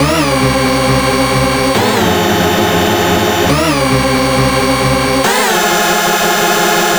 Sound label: Rave Down